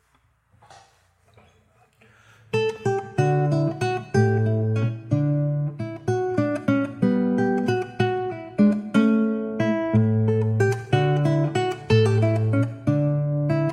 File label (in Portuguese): violao estudo; sor; op31-03